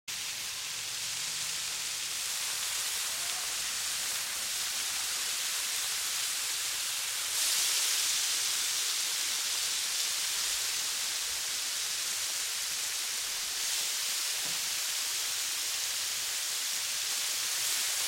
STEAM ESCAPING

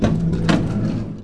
slider

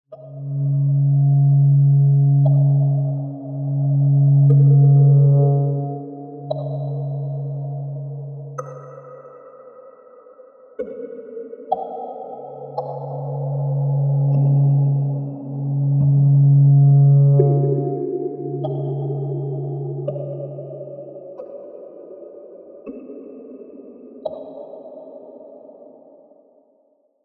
stairs

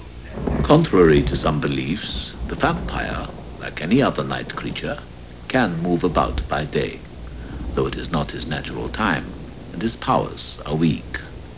vampire; Nosferatu

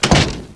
door shut

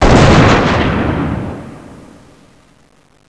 explode; fleetworld

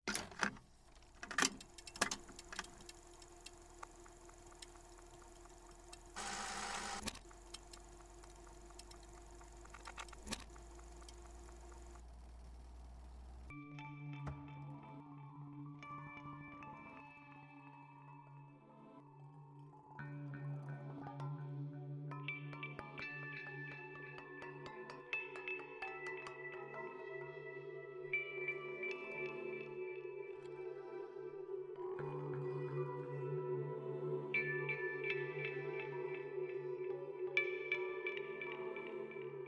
Bedroom Boombox; roomeyes; 1 MISSING FRIEND

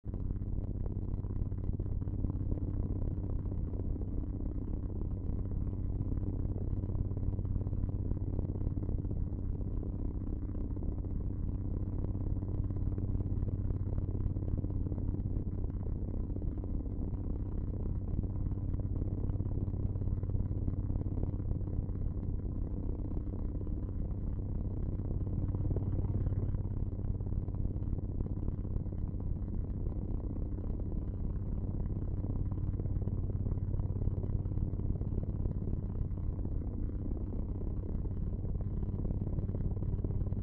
main hall; roomeyes; A tourist trap

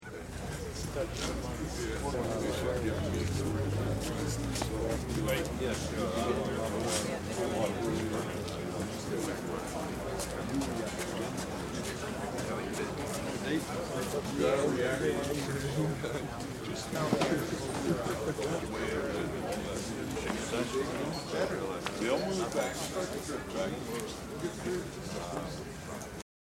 alley crowd

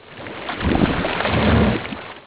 fish clos

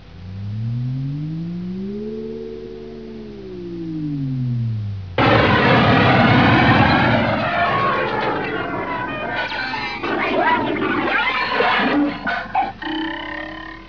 Tardis fails

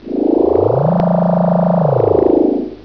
Doors open; aussie

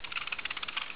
ladder

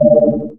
beep

